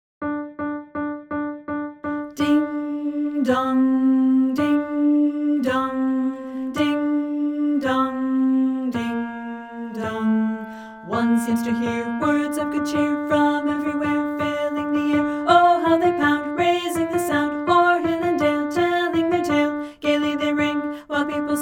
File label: vocal alto; carol of the bells